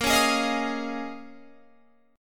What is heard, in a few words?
A sharp Minor 11th, Guitar, Open F; A#m11; strummed